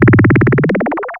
SYN FX; X-Static Goldmine 1

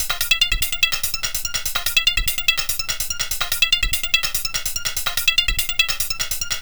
Bionic Notes; Sonic Foundry (Sony Creative Software); Club Joints and Street Anthems

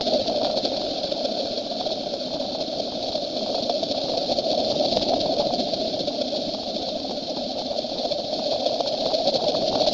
WHIRING; ENVIRN-AMB

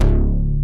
NOIZE BASS; Masterbits; Dance Trax

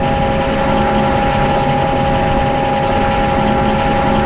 TRUCK CHRD; Spectrasonic Distorted Reality 1; BIZZAROS

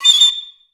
A6, Orchestra; Best Service; Peter Siedlaczek's Advanced

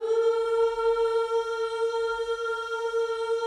OOS A#, Vocal Gospel

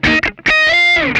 OCTICK, Funk Guitar; 90 s Sample CDs; Zero G

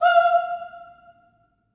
Staccato M F5 R, Symphony of Voices; Spectrasonics

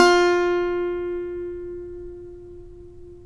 saz; Roland - LA Composer vol. 3

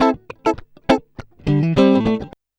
104 FUNKY, Electric & Acoustic Guitar Loops

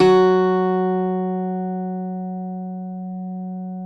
CUATRO F# 3, Guitars; Spectrasonics; Hans Zimmer Guitars VOL-2

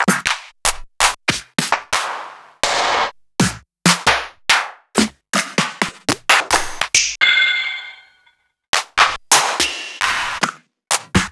Claps

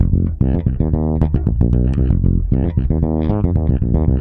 F 114BPM, PEDULLA BASS; Masterbits CLIMAX 7

